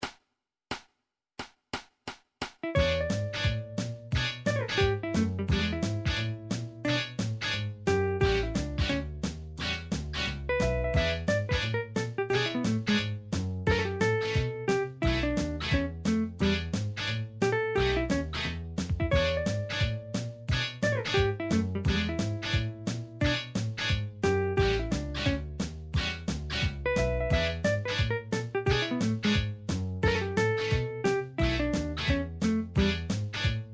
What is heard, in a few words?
blues